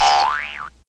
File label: BOING MEME SOUND EFFECT